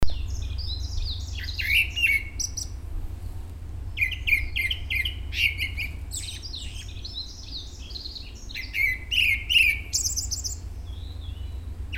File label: thrush grey-backed Turdus hortulorum